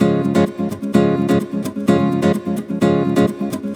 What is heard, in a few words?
Nylon Guitar Kit, C# maj, 128BPM; Vengeance Samples; Special Sounds